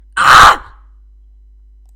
aaaaaahhhhh

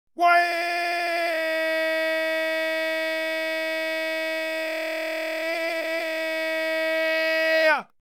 crying

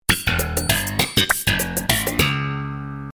sitcom transition bass riff; seinfeld

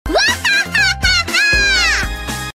whahahaha

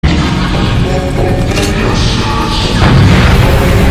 granate launcher toilet

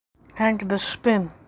not screaming; henk de spin

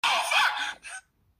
aww

